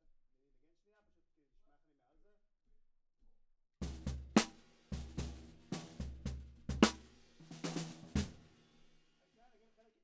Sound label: Snare Bot